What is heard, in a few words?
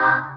Synths C; vengeance essential club sounds-3